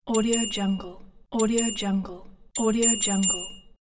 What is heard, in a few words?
bicycle bell